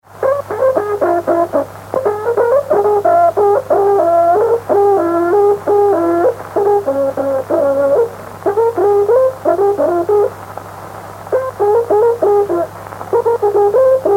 Toque de trutruka; Puede ser en Boroa, Collico o Dollinco; Música Mapuche

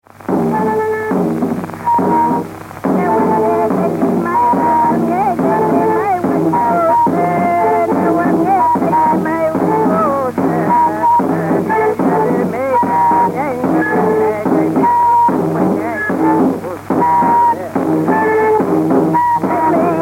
Coro de mujer con kultrún y pifilkas; Música Mapuche

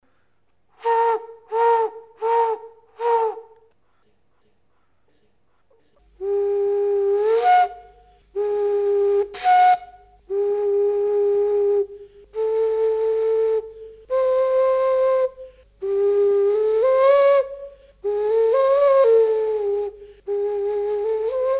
flauta globular

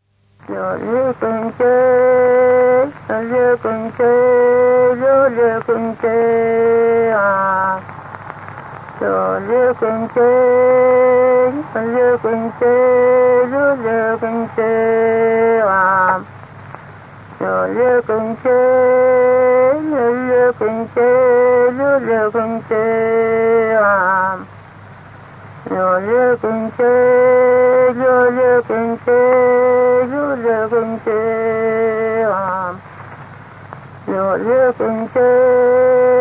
Canto de mujer. Quilay Quina Neuquén Argentina; Música Mapuche

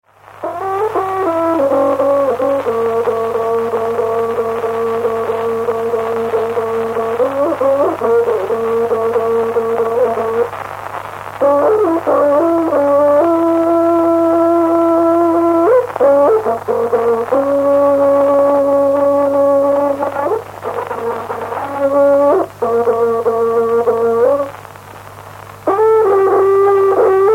Toque de trutruka; Música Mapuche

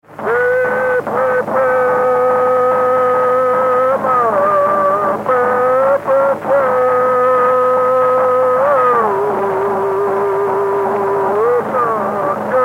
Canto de mujer con kultrún; Música Mapuche

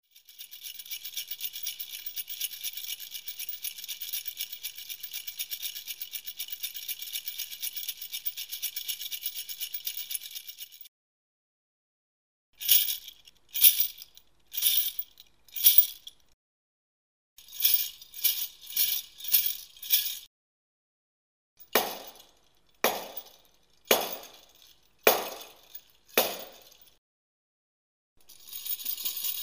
cabeza de cetro sonaja ornitomorfa

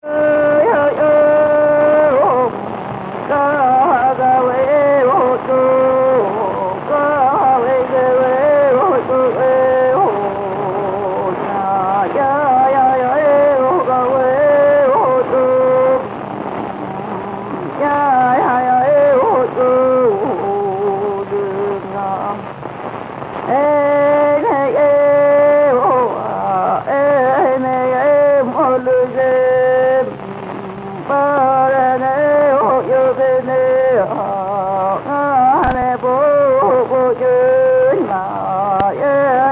Canto; El Naranjo, Lonquimay, Chile; Música Mapuche

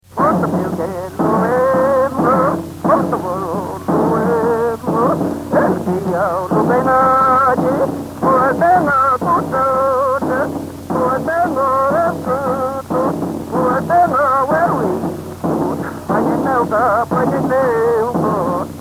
Canto de machi con kultrún; Collico, Puerto Saavedra, Chile; Música Mapuche

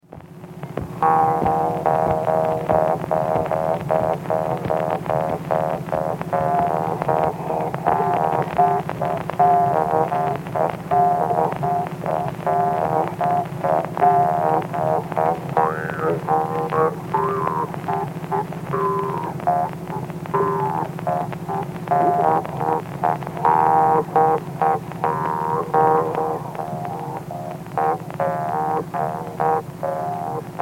Toque de trompe; Música Mapuche

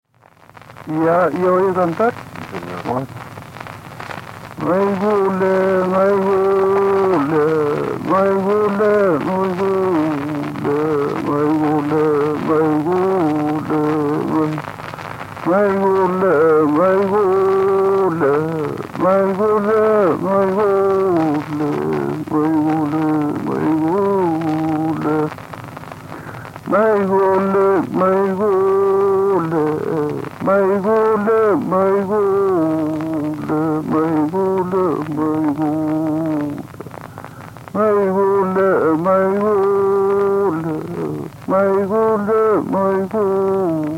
Canto de hombre; Música Mapuche

